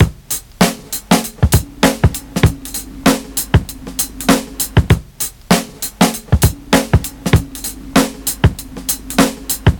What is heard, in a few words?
98 bpm breakbeat sample A sharp key, kick tuned to the A# note; drum loops